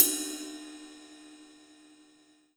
Korg TR Rack Standard Kit Ride Cymbal

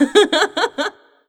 Woman Laughing

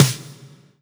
Roland SC 88 Snare Drum

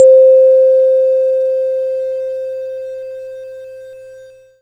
Yamaha PSS-140 Fantastic Piano C5

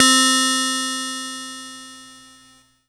Yamaha PSS-170 Harpsichord C4

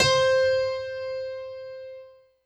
Yamaha EX5 Stereo Grand C6

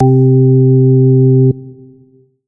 Roland SC-88 Percussive Organ C3

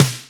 Roland R-8 Fat Snare

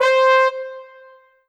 Kawai K5000W Dubl Bone C5

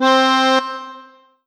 Yamaha MU90R Lambada Acord C4

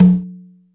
Low Conga

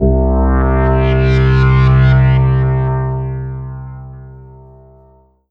M-Audio Venom Currents C2

